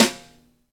XV Snare, Roland XV-5080

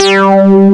TAURUS; Moog Synths; E-MU Formula 4000 Series Vol. 3; Analog Odyssey

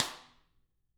Drums, SNRR; CAMCO

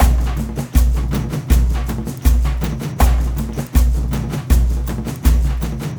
AF TRANCE; Spectrasonics; Heart of Africa 2

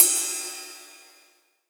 Ride, Rides; Electronisounds

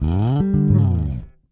Slide G, Bass; Bass X

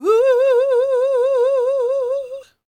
CROON, Soul

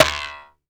RAIL BUZ; Rarefaction; Poke in The Ear With A Sharp Stick

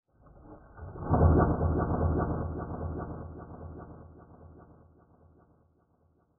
fx splash

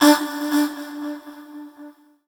Dance Vocals, FEMALE

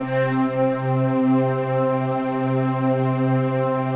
PAD, DIGI-PAD

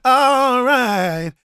GOSPEL MALE; Spectrasonics Vocal Planet

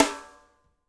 SHUFF SN, SNARES; Spectrasonics; Burning Grooves

